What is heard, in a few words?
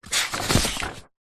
household book artists sketch pad page turn; zapsplat